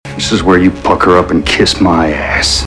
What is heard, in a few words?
pucker up